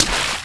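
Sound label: splash, FOOT; The Elder Scrolls III - Morrowind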